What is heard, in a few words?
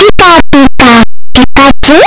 pikachu cry; pokeyellow